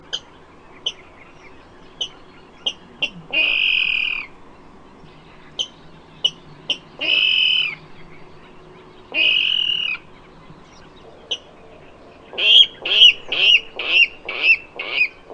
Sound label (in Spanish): rascon europeo